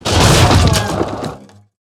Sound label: Instrument Case Death